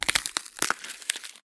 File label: Gore Crunch Rip; Just Gore AddOn; soundbits